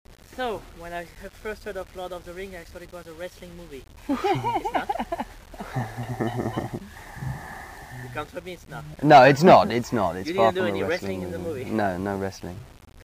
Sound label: laugh